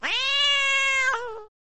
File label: Angry cat meowing